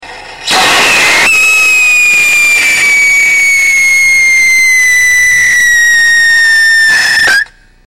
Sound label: iron oxide potassium benzoate, rockets whistle tests; Core Burner; Very raspy start. Speed similar to no catalyst.; Iron Oxide1